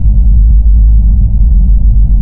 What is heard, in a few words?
atmosphere; KATANA; Daikatana